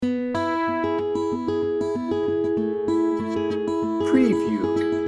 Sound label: pure americana loop